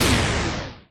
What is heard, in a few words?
play Back To Basic Sound; jackpot 6000